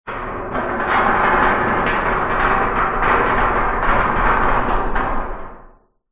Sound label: foley metal large heavy movement resonates reverberant; zapsplat